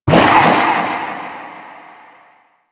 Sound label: Underwater Poison Spore; Warframe; Grineer Ocean